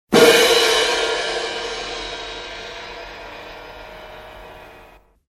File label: cymbal; PERCUSSION INSTRUMENTS